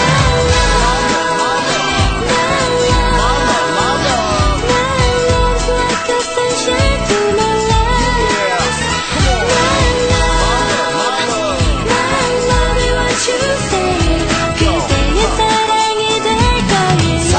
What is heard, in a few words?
Kpop